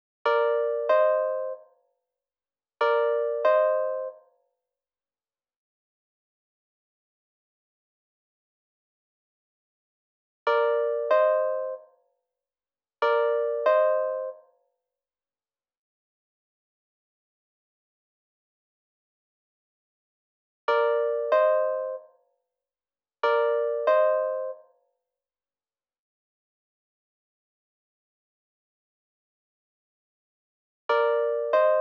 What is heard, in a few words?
Wurly EP Scoop stereo